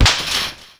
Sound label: Fence Gate